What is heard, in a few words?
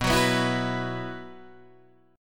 B Suspended 4th, chord